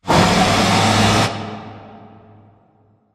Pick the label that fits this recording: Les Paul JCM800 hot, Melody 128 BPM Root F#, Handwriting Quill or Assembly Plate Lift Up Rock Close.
Assembly Plate Lift Up Rock Close